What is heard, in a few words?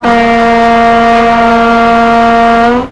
klaxon, Train; OpenBVE; 55022 Royal Scots Grey